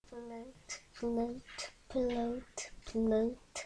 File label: flute